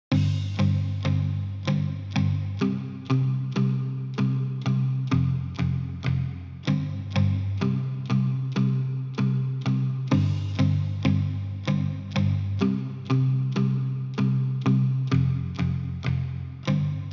Island Hopping Underscore, Summer Daze; mellow island beats; Narrative Mind

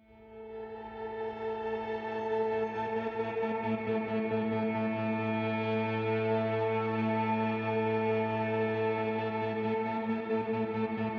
Growing Tension Underscore; Narrative Mind

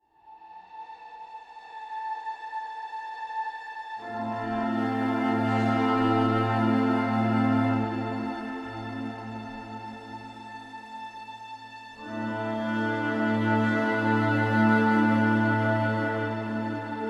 Breaking Waves Underscore, Cinematic Textures

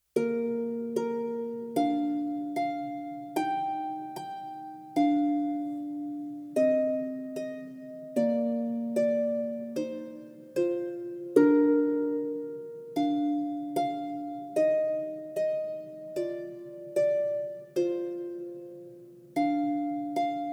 Harp Version; Twinkle Twinkle; Tiny Tunes; Narrative Mind

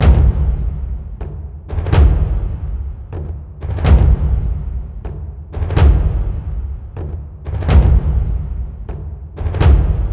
The Story Continues Underscore, Percussive Drama; Narrative Mind

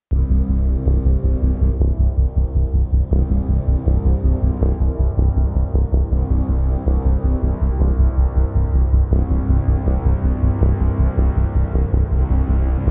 Reaching The Peak Underscore, Epic Hybrid; Narrative Mind

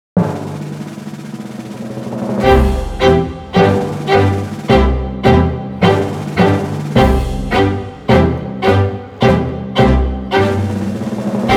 C Major Trumpet Tune Underscore; Purcell

